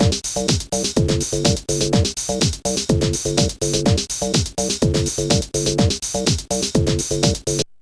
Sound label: sfx loading music; SoundFX 1.3